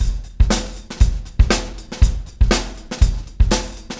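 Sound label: 120 beat; Big Fish Audio - DOM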